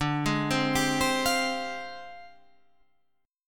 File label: F Minor 6th Add 9th, guitar chord